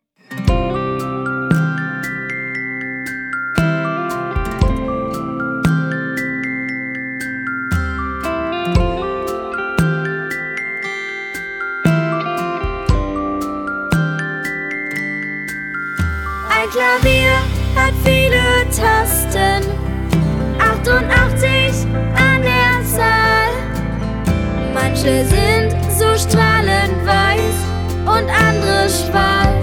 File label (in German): Die C-Dur Tonleiter